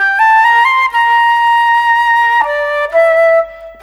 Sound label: Flute, Orchestral Series Rock and Pop